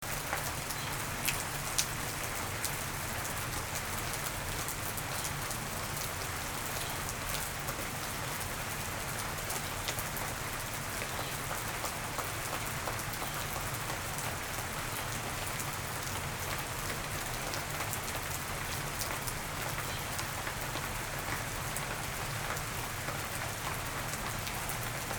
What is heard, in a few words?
summer rain soundscape